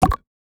Craft Air Bubble Suction Pop Cork Water UI; User Interface

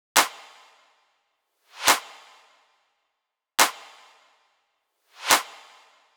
Riddim Drum Loop Clap (140); Drum Loops; Ghosthack; Riddim Essentials 2020

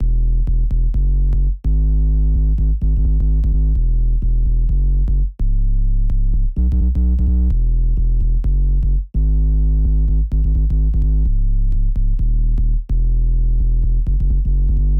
128 BPM G# Min Sub; Drop Loops; Take Me Away

